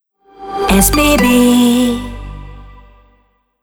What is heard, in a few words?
Sound Logo; Audio Branding; SBB